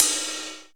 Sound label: FX Cymbals, Percussion; Dance Synths